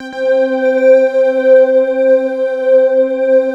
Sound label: GLITTER, KORG; WS KORG#1